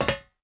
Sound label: walk metal dry; MOTS